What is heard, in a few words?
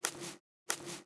Otter Walk